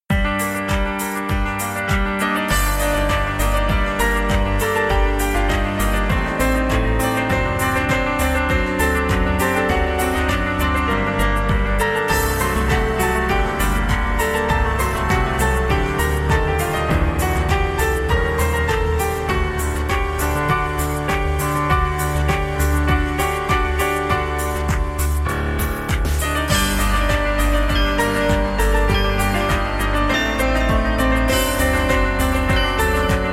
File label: SINFONIA N 40 PIANO COVER